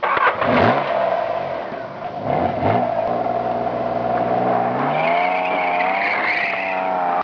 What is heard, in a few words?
burn